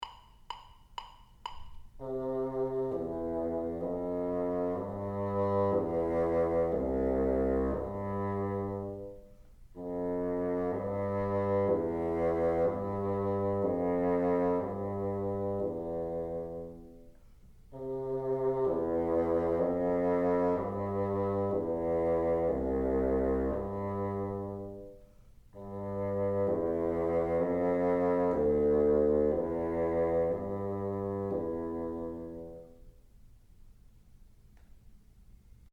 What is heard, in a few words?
teacher, bassoon